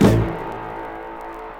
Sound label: Stabs